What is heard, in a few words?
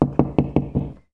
knocking on door sound